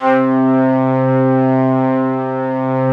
ST ORG, STRINGORGAN, Yamaha VP-1

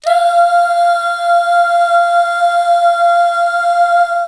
DUUH, BACKGROUND

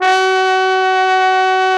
TROMBO; Trombone

